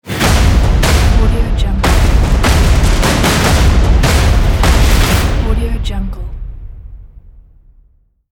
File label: battle drums logo; noobtorio